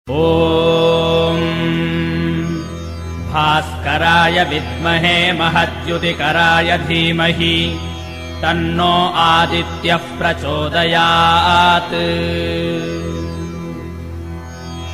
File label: SURYA GAYATRI, mantra vedic